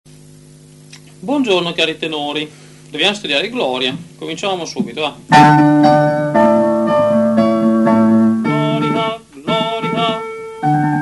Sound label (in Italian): Gloria in excelsis Deo Tenori; Vivaldi